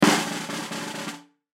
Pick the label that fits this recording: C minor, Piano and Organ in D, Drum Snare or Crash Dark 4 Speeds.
Drum Snare